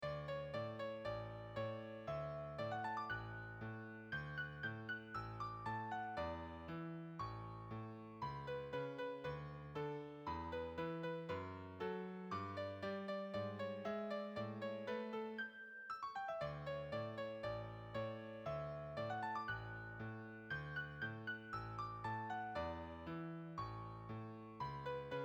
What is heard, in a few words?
Melody Bass